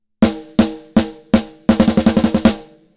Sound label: Snare bypass